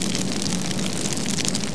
campers fire, ambience

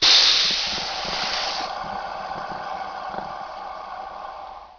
steam burst; sava

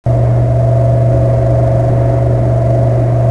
amb comp; cruisecontrol

